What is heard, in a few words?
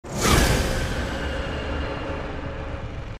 Zoom Lightning